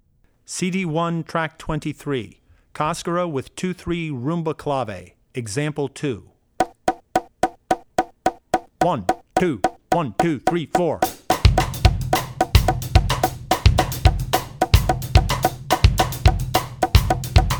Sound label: Cascara (2-3 Rumba); Drummer's Bible Tracks